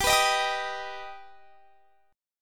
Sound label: G Augmented G, chord; strummed